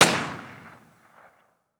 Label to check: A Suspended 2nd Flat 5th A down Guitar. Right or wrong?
Wrong